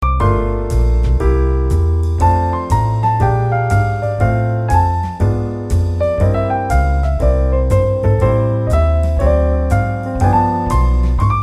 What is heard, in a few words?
Jazz Music Piano